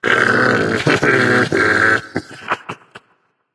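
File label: tongue, non-verbal; smite; voicepack; merlin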